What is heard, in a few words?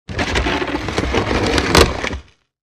Heavy Wood Door Rattle